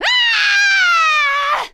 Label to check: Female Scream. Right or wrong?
Right